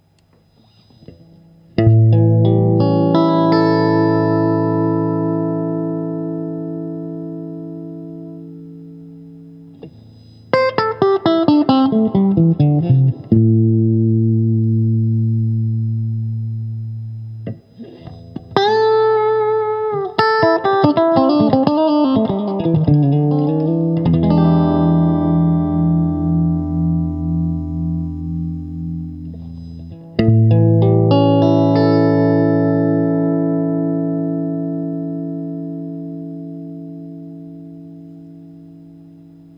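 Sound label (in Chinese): 60S OCC 单晶铜 清音 2档, acoustic guitar; kindman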